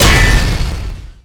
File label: Explo Fire Extinguisher; Destruction